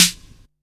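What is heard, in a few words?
snare processed, Disco House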